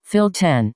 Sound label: Fill